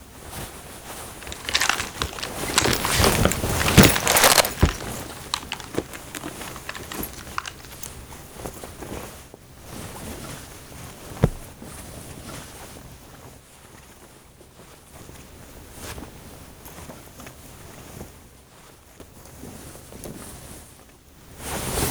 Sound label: stop bleeding; Modern Warfare